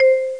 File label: hi donk; Know Your Stuff: Formula 1